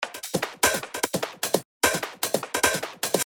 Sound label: hat